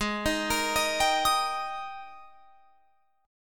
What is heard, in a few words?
Ab Major 7th Suspended 2nd Ab Guitar Open F; arpeggiated; JGuitar